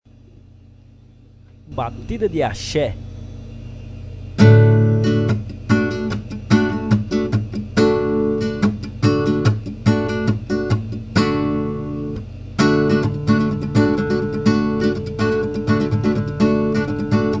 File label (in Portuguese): Batida de axe